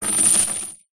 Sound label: industrial chain rattle set down wood